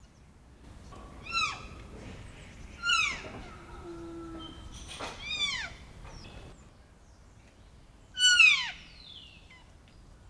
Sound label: Cervus elaphus canadensis female; Canadian wapiti hind (C. e. canadensis) is calling her high-pitched calls at arousal evoked by rutting bugles of a male in neighboring enclo